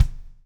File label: BLUES TK, Backbeat; Spectrasonics - Backbeat